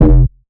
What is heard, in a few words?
Bass F, Bass Synth